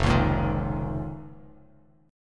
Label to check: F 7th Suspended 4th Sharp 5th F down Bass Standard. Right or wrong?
Right